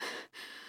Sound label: Pant; Lewd SFX